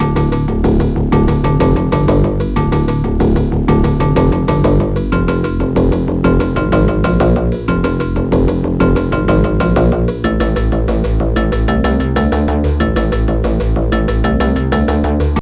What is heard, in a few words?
bass riff; SonicArranger